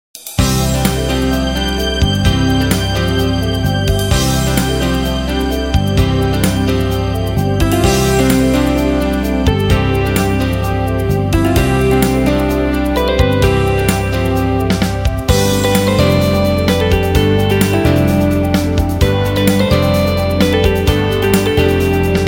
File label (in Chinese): 专题片背景音乐